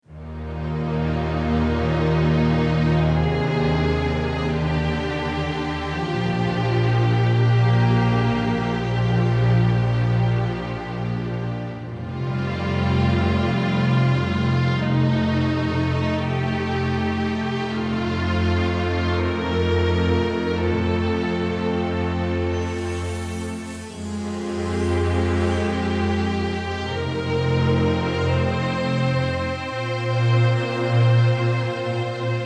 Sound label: Field BPM84 Eb